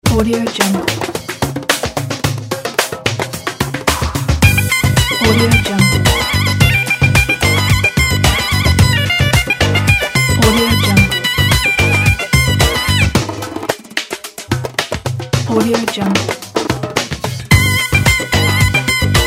zurna pop

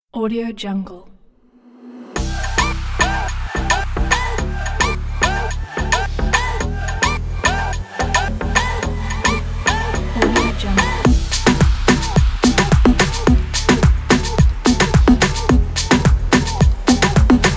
summer dance logo

